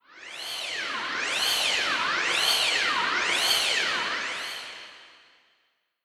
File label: Bunshin Rotate; Pac-Man Championship Edition 2 Plus